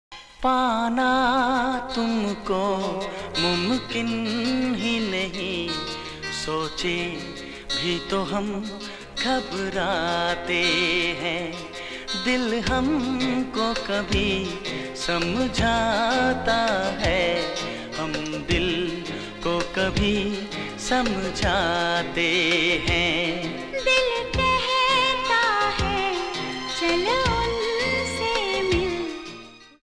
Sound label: ringtone